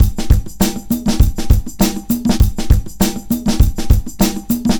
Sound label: Combo, R&B Drums